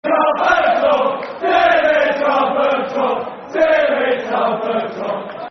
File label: Football Chants (Soccer Song); Dimitar Berbatov; Tottenham Hotspur Thfc